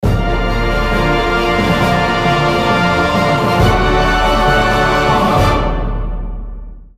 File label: Victory; Mytran Wars; PSP